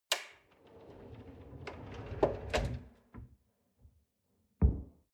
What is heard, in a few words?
folding door close long